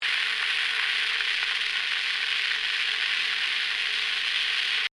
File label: Phonograph Wax, Technology, Phonograph, Wax, Cylinder, Static, Loop, 1896, Edison, Presentation, Machine; Miscellaneous Electronic; BlastWave